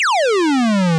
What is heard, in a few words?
big Hit; ScummVM; freescape; DOS